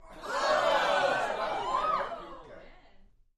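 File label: Crowd 15 Interior Rowdy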